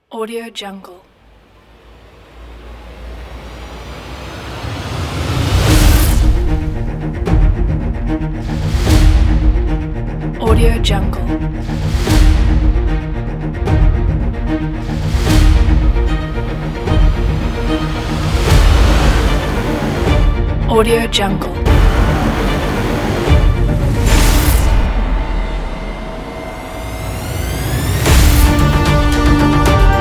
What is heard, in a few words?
heroic blockbuster teaser